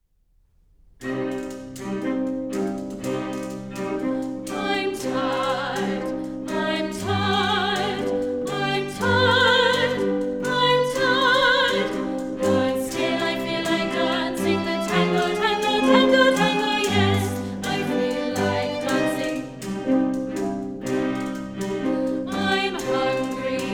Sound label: tango english